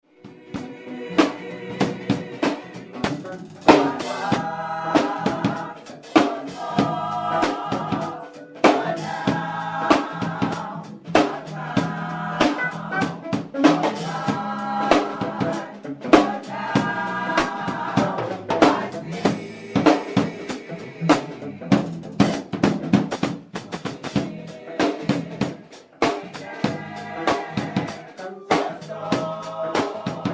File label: Amazing Grace Glory Rehersal